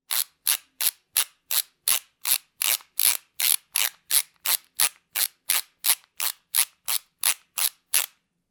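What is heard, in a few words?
ratchet sound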